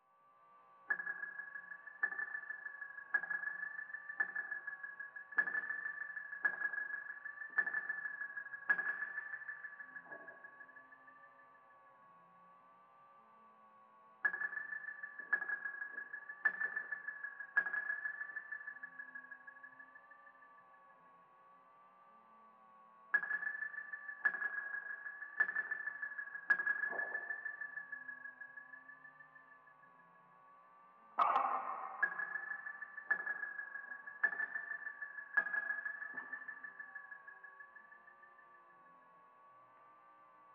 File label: Guitar